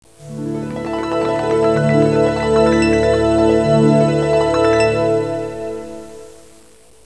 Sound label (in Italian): suonerie classica; musica classical